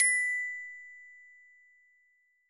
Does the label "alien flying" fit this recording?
No